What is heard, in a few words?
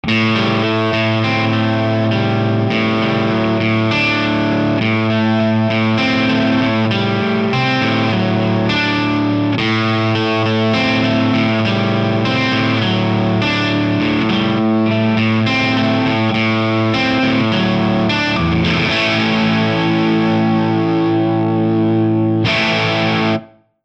overdrive; firebottle; effectrode